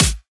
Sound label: dry snare drum sample a key, acoustic snare sample tuned to the A note